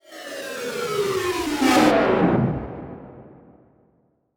Title Transition Descend; Adobe; Production Element

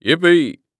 Human Male Yell Yippee Deep Short

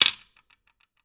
Impact Chess Piece Fall Over Glass On Glass

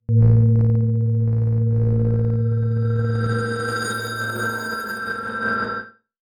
Title Transition Evolver; Production Element Title Transition

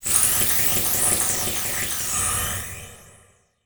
Transition Electrical Warble; Production Element Title Transition

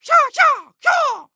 Human Male Karate Yelling

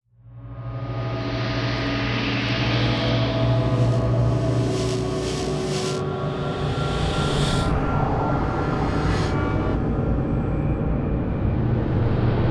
Groans Beeps; Module Production Element Title Imaging; Imaging Elements

